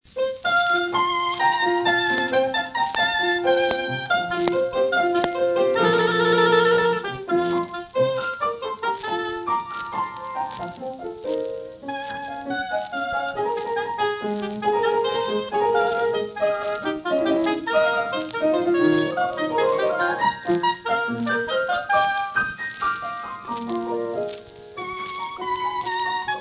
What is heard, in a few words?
sonatina oboe piano